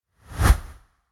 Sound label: Short Whoosh